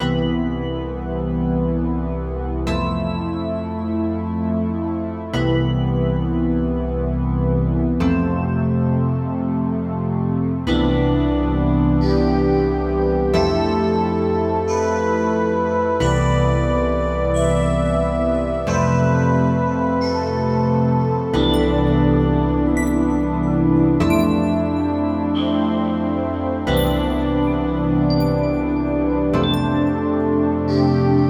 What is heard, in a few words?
Digital Alchemy Pads Bells; KaoX; Cardinale